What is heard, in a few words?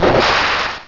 Aqua Jet; pokerogue; battle anims